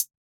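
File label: hi-hat Closed, Yamaha DJX 2B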